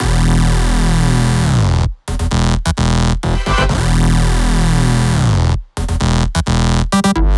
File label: Machine Bass Root E, 128BPM; Vengeance Samples; Machine Kit